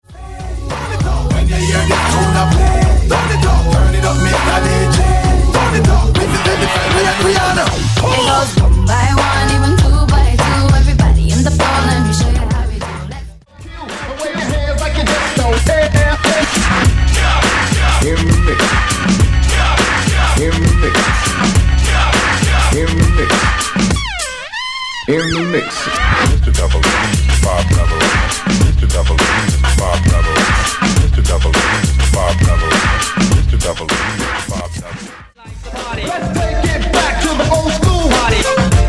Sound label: Old School Hip Hop RNB Megamix 91 110 Bpm